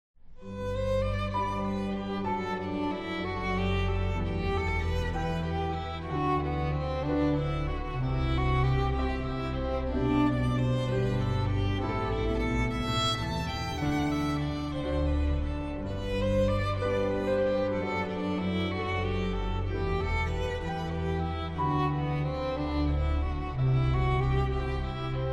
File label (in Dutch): instrumentaal; Nader mijn god bij u; cd overdenking